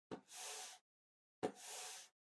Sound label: PC Mouse Move Far Soft Pad Hit